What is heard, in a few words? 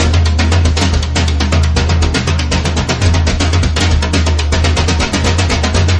drums; horde